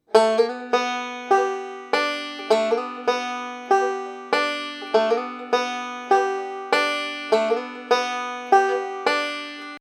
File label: banjo licks